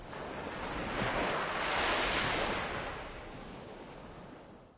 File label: Wave, terrain; Kings